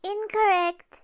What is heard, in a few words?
incorrect